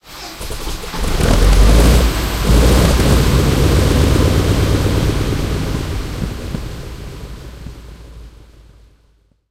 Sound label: sherman m4a1 engine int start, tanks; engines; War Thunder